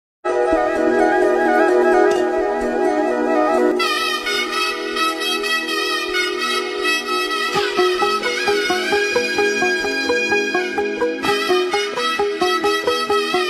telugu trending bgm

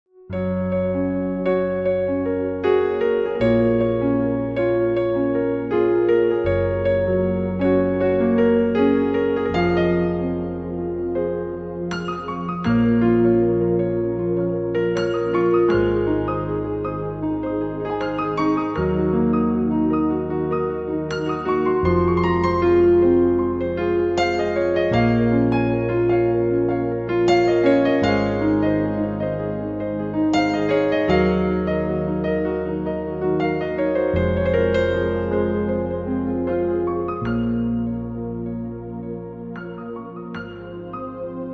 Piano; Animal

